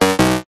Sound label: multimedia game incorrect buzz tone